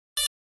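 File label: Flash Beep Electronic Beep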